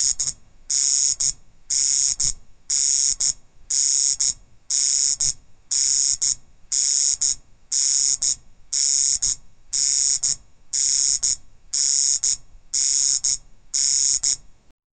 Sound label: unsettling alarm sound, buzz